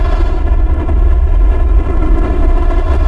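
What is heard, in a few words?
fast loop, ships engine; Quantum Redshift; darius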